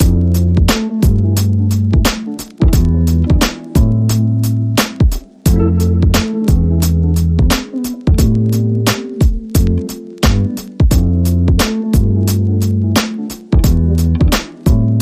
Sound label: Lofi Dreams Rhythm